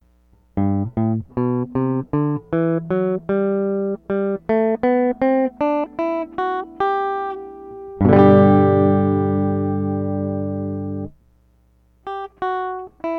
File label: Reverb Bypass G Major, guitars; Pedal Effects; BYOC